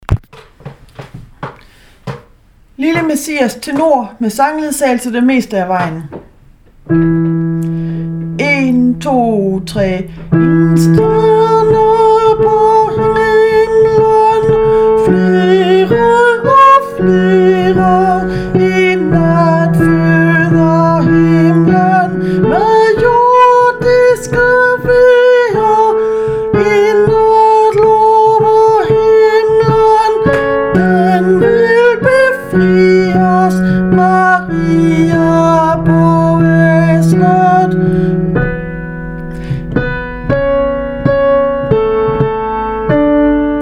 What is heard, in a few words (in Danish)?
tenor m. sangledsagelse; lille messias